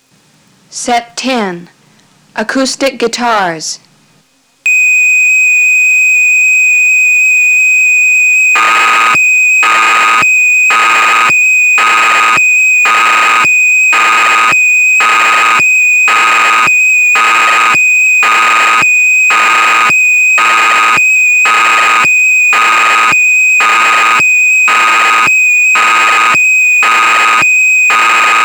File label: Acoustic Guitars, dx9